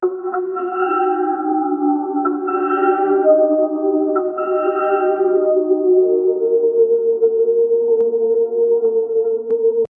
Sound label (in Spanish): curando aliado, healing